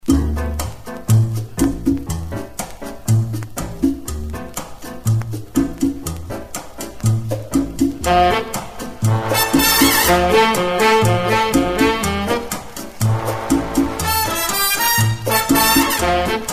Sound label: Cha Cha Cha